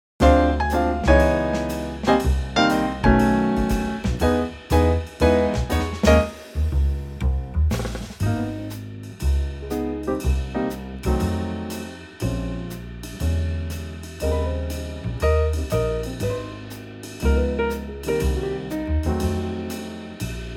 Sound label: Trio Ab swing